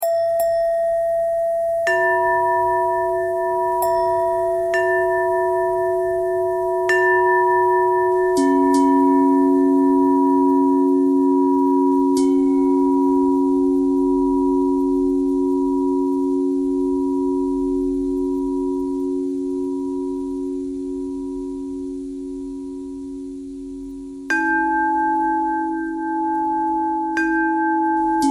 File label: bell bell thread noise